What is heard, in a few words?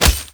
Unarmed Tempest Punch Impact Default; Weapon Impacts; Combat